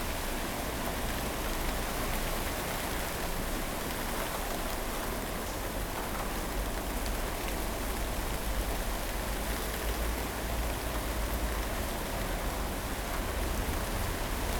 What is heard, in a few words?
rain street; 99Sounds; Rain And Thunder